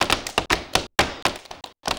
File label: 120 clap